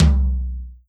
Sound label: tom lo; osu